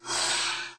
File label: Air, Train; OpenBVE